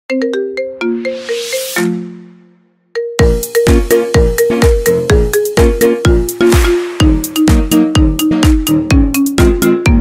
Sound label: remix ringtone marimba